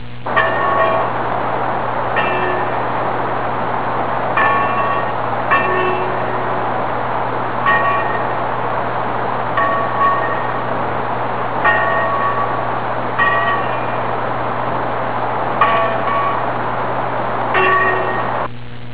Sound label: Sea Buoy